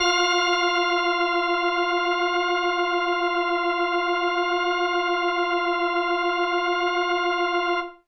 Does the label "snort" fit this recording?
No